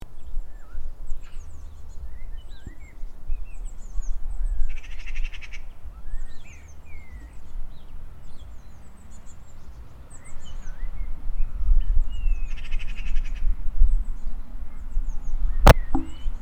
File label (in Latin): Pica pica; SETE